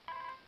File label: motorola razr ringtones notify